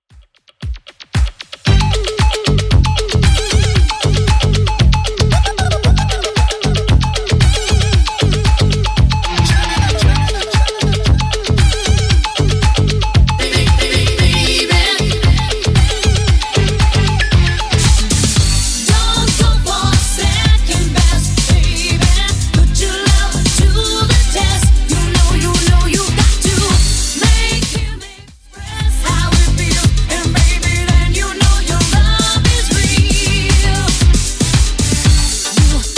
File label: 80s Redrum 115 bpm